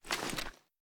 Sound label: inspect; gmod; viper; weapons